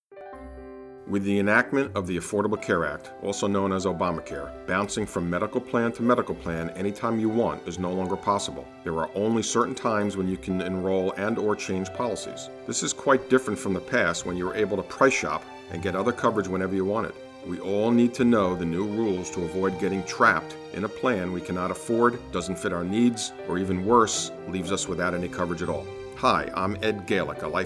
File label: Trap; COBRA